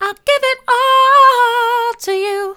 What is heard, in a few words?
i'll give it all to you soft, vocal